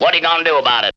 VOX

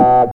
TECHNO; E-Lab; X Static Goldmine 1

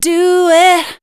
voice spectral, ly short

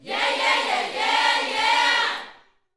Vocal Gospel PHRASE

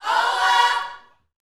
YOUTH CH PHRASE, Vocal Planet Gospel

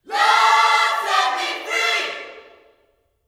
Vocal Gospel MULTI PHRS

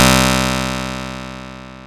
BASS

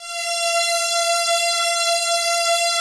ATMO PADS; Best Service; XX Large

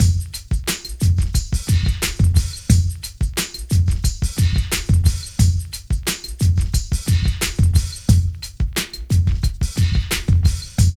PHAT LOOP; E-Lab; X Static Goldmine 2

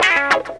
GTR RIF; E-Lab; X Static Goldmine 2; akai

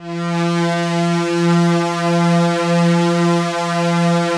ATMO PADS; Best Service; XX Large - Pads

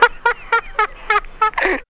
LAUGH; E-Lab; X Static Goldmine 1

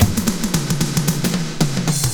DRMROLL; E-Lab; X Static Goldmine 2; akai

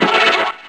TECHNO

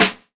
SNARE; E-Lab; X Static Goldmine 2; akai

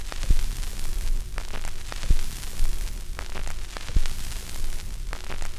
CRACK, CRACKL FX

